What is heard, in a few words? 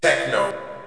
techno; Kermis Jingles Diversen